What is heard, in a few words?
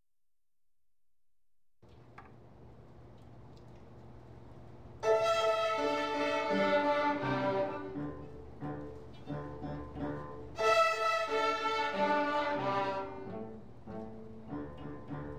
fiddlin tune